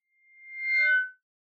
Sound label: Megaphone Feedback Dry